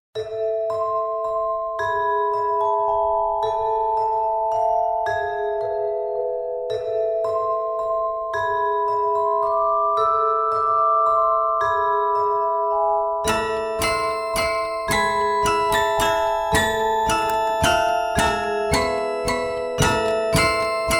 music box

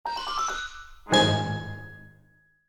sound logo; Jingle/Eye-catch

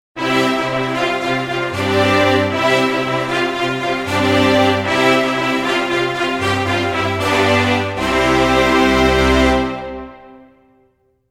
fanfare

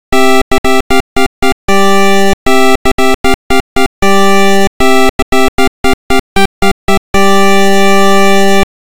8bit fanfare

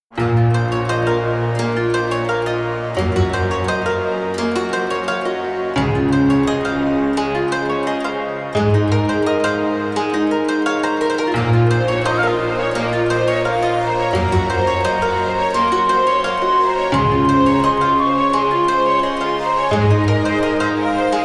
japanese style trailer

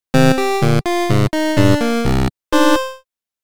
8bit game over; Jingle/Eye-catch

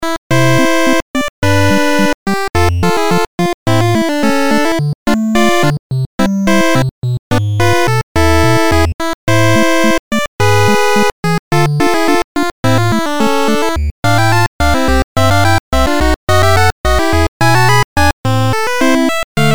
8bit kyuuden shirabe

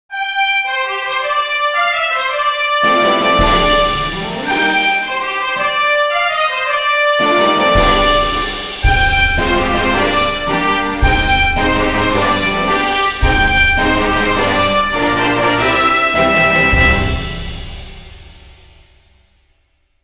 fanfare

